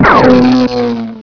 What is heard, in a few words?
field impact; gearbox; flier